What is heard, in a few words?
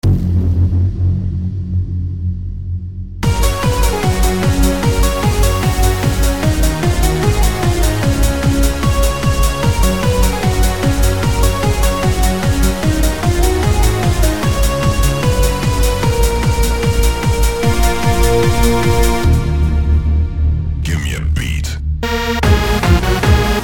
Kalinka Hardstyle, Russian music